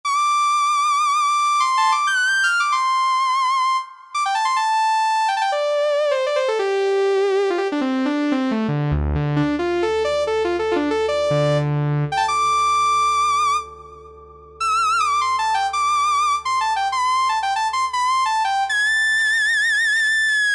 Minimoog Lead; Sonic Reality Modern Songwriter

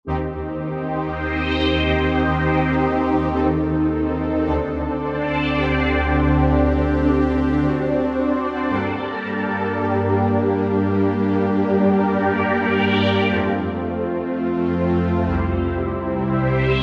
Pad; Roland JV-2080; E-Motion Pad

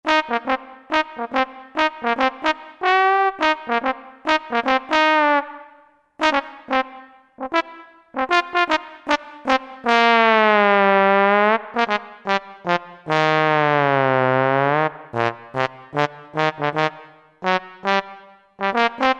Trombone; Roland JV-2080

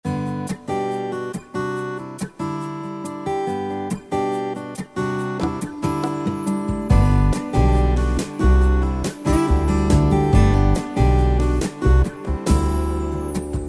Worship Med, Yamaha PSR-3000; Factory Demos

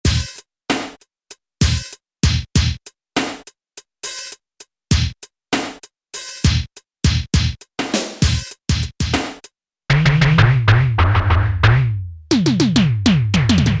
Electric Set, Roland U-220; Factory Patches

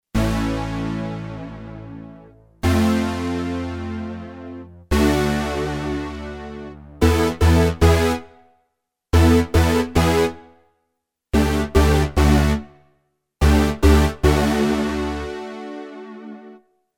Big PWM, Kurzweil K2500X; Factory Programs

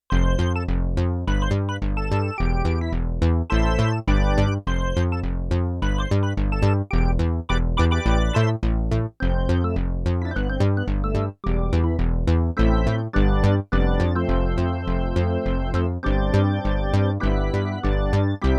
Syn Bass-Organ Split, Yamaha TX16W; Combo Performance 1

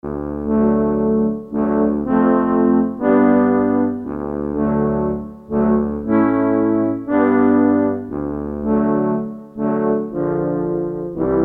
Horns, Korg DSS-1; Brass

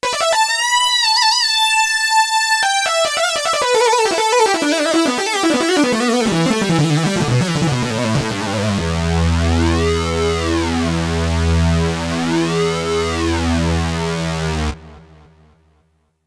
Syn Lead 1 & Syn Lead 2 - layered, Roland JX-1

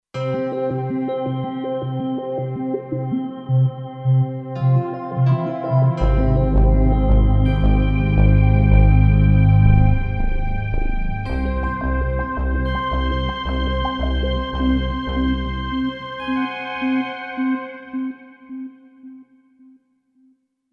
Hertz, Kurzweil K2500X

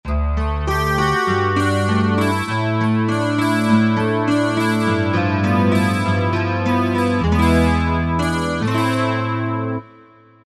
12-String, Korg M1; Factory preset examples

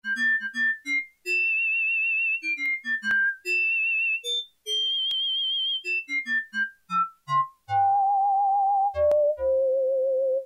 Whistling, Yamaha TX81Z

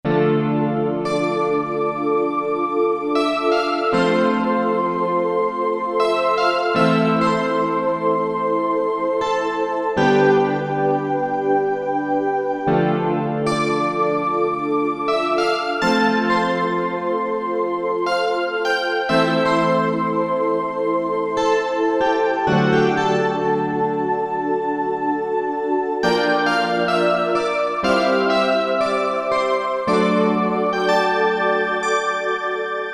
New Light, Korg MSC-2S Synth combinations; Synth 1

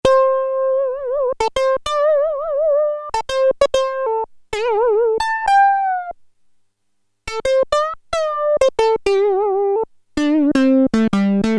Solo, Prophet-5; Sequential Circuits Prophet-5 (Ver.3); Factory Patch; File 3 Programs

